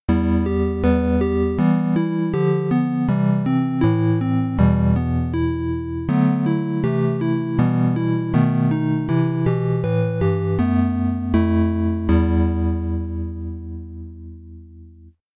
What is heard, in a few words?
Setup Music; Zorro; DOS